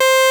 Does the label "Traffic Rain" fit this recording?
No